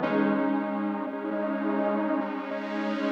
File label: Pad Warble; Lost Tapes From Mars